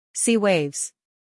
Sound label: sea waves